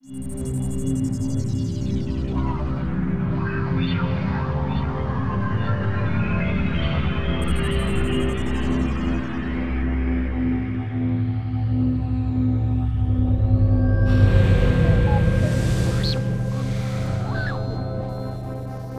Trepidation; Bit.Trip Core

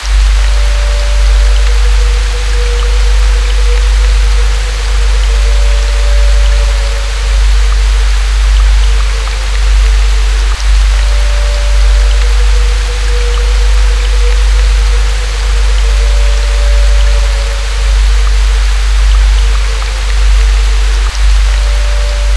Haunted Rain

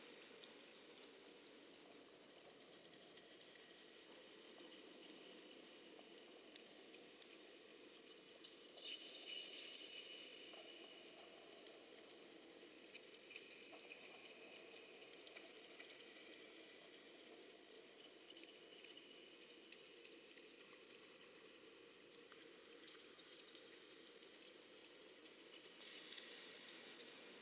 Menu Ambience LOOP

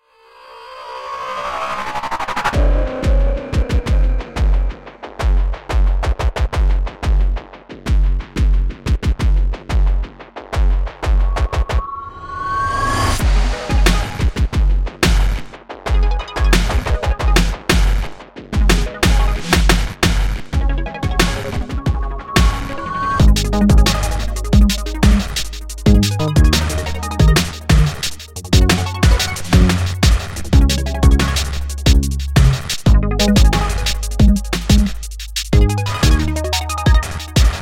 electronic menu music

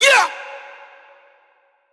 Intro Music Vox; Subway Surfers Tag; Sfx Tutorial

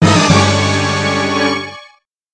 Movie BGM Shock!; Mario Artist Talent Studio

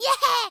cheer; Ginger; Builder

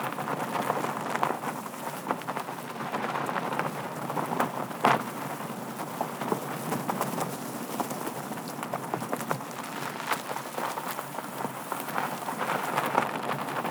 robot roll loop; teardown; ps5 windows xbox series xs

